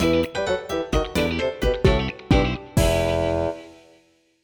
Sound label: game over; GC Minigame - Game Over Cue; vila virtual